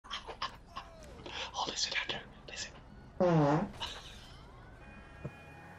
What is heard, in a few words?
fart